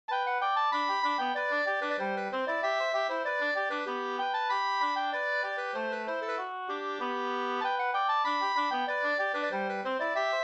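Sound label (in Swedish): slangpolska, two-part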